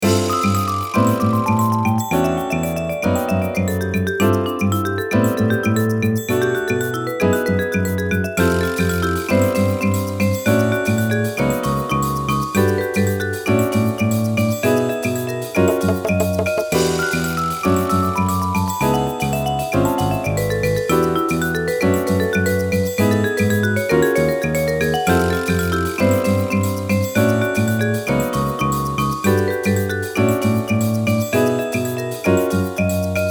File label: Tropical, Nokia ringtone